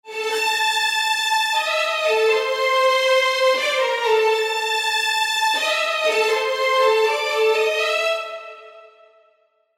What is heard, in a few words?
action melody